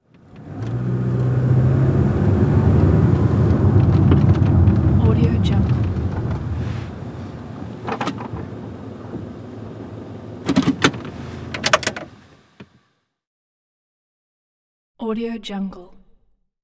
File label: car sedan parking maneuver